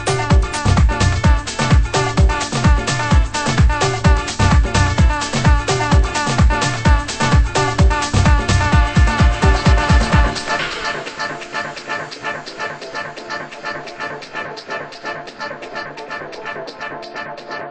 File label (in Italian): ritmo